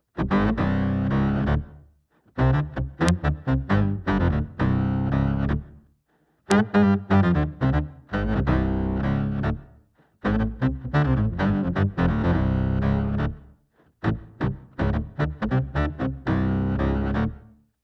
Reproduction of articulation POG 2 Octave down and 2 octaves down; Saxophone; Pitch Manipulation Pedals